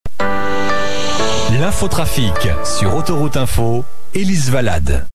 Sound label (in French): Jingle Info Trafic; Autoroute Info